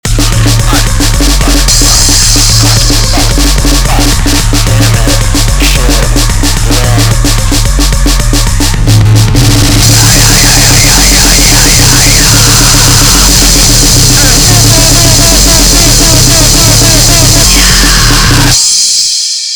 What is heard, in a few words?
NOISEGRIND; SPRUNKI; SHITGRIND NOISE